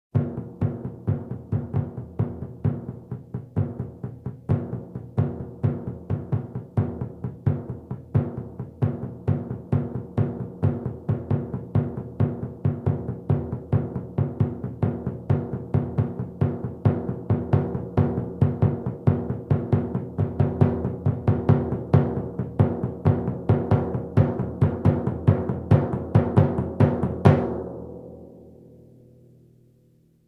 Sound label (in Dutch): Jungle Geluiden Drums; KMP Film Video Sound Effects